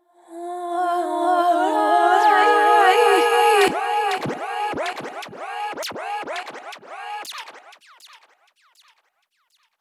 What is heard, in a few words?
Sahara Static, Vocal Samples, Sound Design; Sonic Foundry (Sony Creative Software); Pocket Diva World Class Vocal Samples